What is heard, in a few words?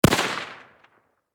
Warfare Rifle .30 Caliber M1 Carbine Semi Single Shot Distant Perspective